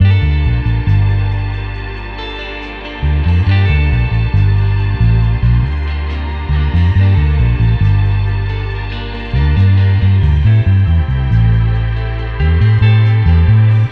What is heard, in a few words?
strings; Phonky